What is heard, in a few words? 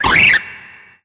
cry